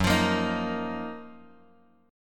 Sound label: F sharp Major 13th Bass Standard; strummed; JGuitar